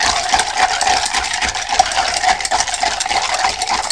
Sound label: shake